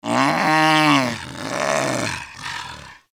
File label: Vulpaphyla Vocal Tranquillized; Hunting Critters